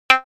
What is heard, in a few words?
synthlead; pulsar